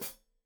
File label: Hat Foot, Hi-Hat, Cymbals Stereo